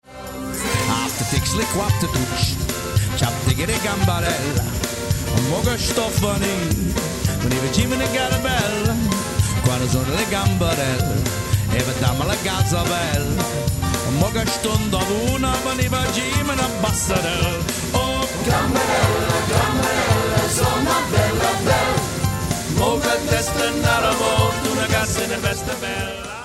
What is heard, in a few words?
Italian Jingle BELLS